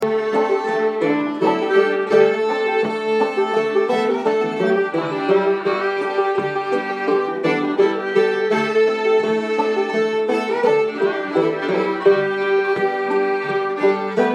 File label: Blues